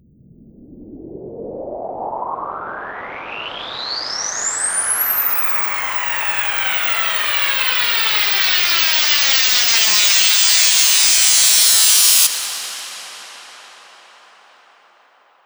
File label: Riser Noise